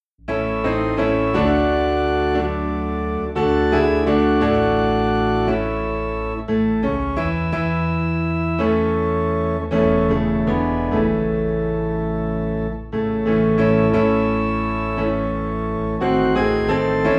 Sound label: Piano and Organ Low in A